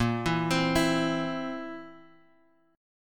A sharp Suspended 2nd Flat 5th A sharp, Guitar Drop D